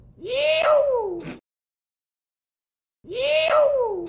iiih uuuu

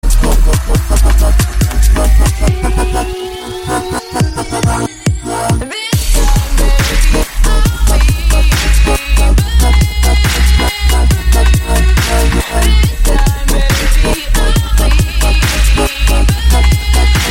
dubstep remix

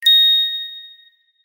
magic wand ping

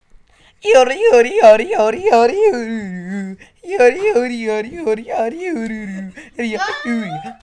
yodeli

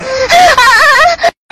ahhh; oni

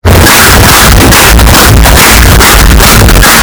bass boosted clapping

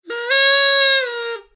birthday horn

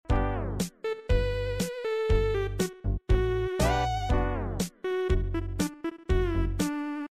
8 bits

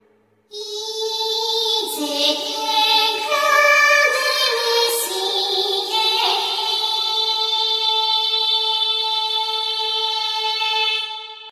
vocal; demon slayer

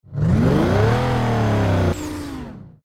lamborghini

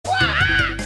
waaaah; crash bandicoot

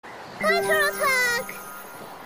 glitter attack; ms fortune

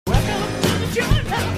jungle

